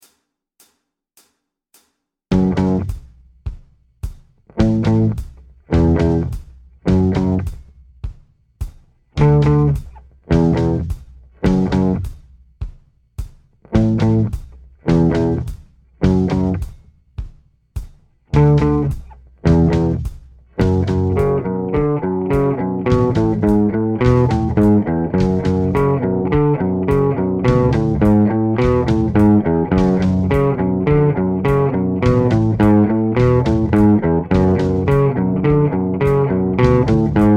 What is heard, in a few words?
riff slower, guitar